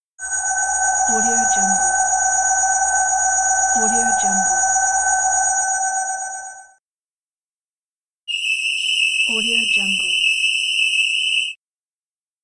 technological laser

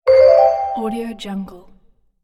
message new

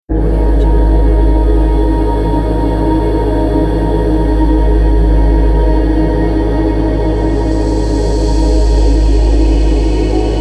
drone

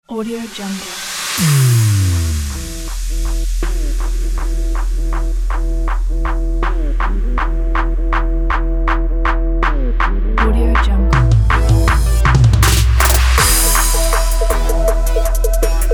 cool basic trap

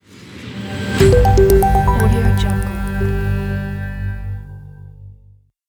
minimal logo

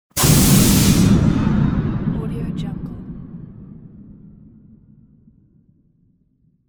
steam release

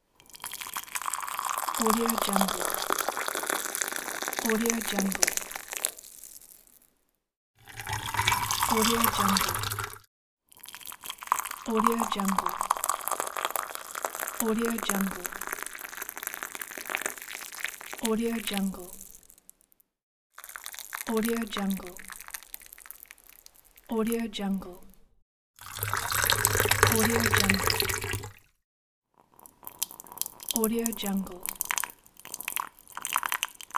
pour boiling water

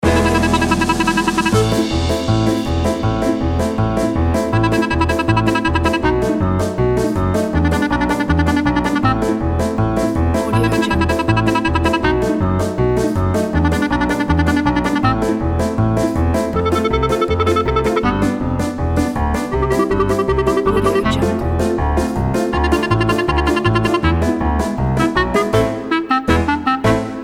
quirky comedy show; pianissimo-composer

